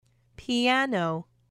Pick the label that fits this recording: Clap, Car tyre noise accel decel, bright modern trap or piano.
piano